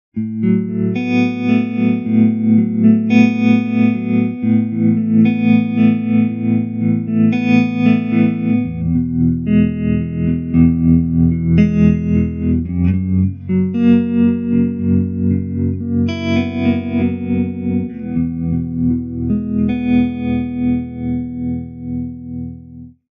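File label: Volume LFO; Voluum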